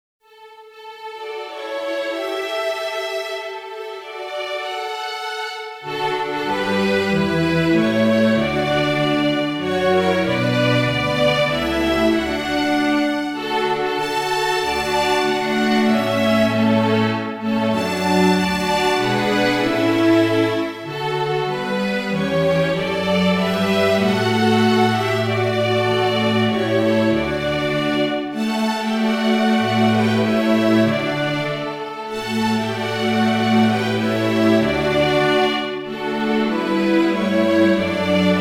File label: string orchester